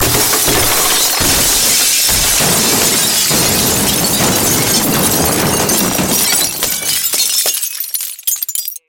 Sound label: Crash Wood Glass